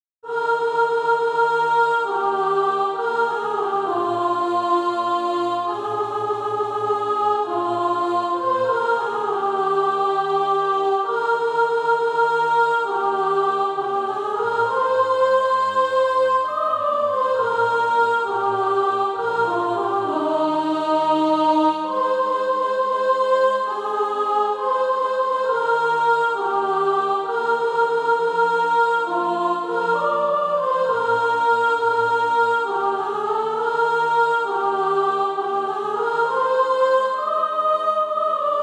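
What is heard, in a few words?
soprano; SATB; Christiansen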